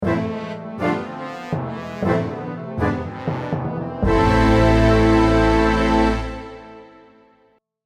Epic, stingers

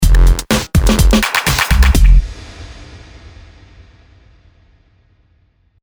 Gritty Mix, stingers

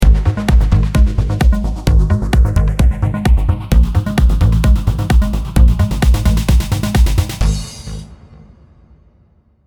Progressive Drips, stingers

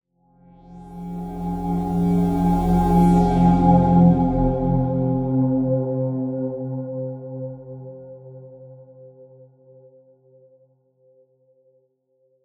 Synthetic Swell

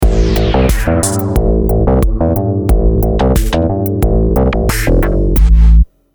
Minimal Black, stingers

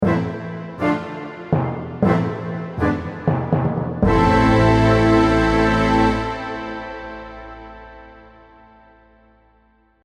Epic, stingers

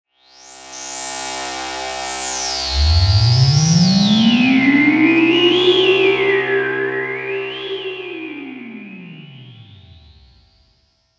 Synthetic Swell

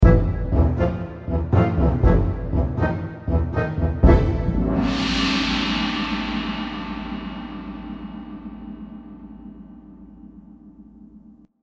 Orchestral PowerHit, stingers

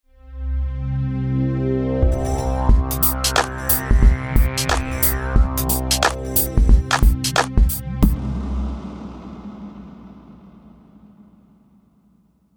Atmosphere, stingers

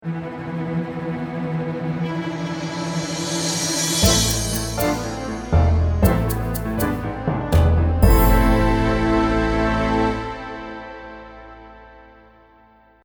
Epic, stingers

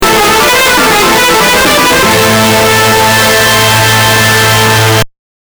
Epic, stingers

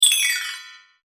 Square Chime

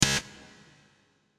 Verby Button

